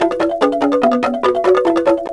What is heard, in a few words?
Bamboo E6; Tonkotsu; CMI